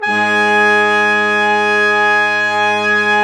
BRS QUINT, Quintet long; Roland L-CD702

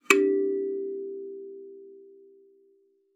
KALIMBA G4; Xsample VOL-7

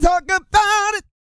SING, GOSPEL; Vocal Planet Vol1 Gospel

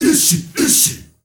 ISHIISHI L, GRP CHANT, Heart of Africa; Spectrasonics; Heart of Africa 1

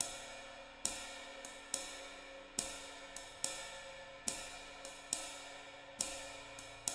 JZ70 SWING, Living Drums; Sampleheads; Peter Erskine

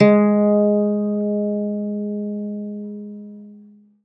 ZITHER, Alpine Volksmusik; Best Service

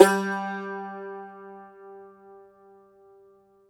cumbus saz G, plucked strings

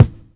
XV Kick, Roland XV-5080

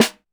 Drum Machines, Korg 01W; 300 Drum Machines; TotalKit

